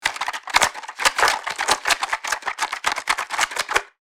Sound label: Shaking small box board game contents